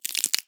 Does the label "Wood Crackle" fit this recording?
Yes